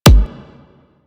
Briefcase Akimbo Pistol Punch; Warframe; Weapons; Corpus

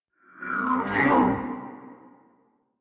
Attack Effort; Warframe; Dax Heavy; en

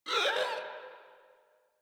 Infested Runner Pain; Warframe; Runners

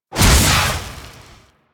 Fire Shot, Flame Thrower; Weapons; Warframe; Ignis Wraith

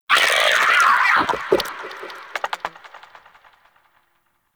Vocal Death, Shrimp Lantern, Creatures; Baliq

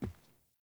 Grunt Boot Walk Wood; Characters; Enemy; Melee Grunt; Footsteps; Boots; Sinecure

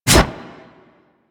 Melee Block Stop; Warframe; Wraith

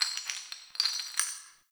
Cave Ocean Rock Crumble; Ambience; Midrath Island

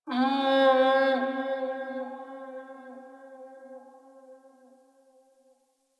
Distant Zone Two Creature; Ambience; One Offs; Warframe; Eidolon Plains

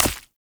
Flock Attack Hit; Powers; Fey

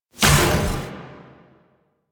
Omicrus Shot; Warframe; Quests; The New War; Weapons

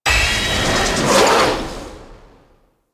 Roar Attack Start; Enemies; Warframe; Infested; ZealoidPrelate

